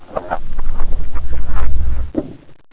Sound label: alien door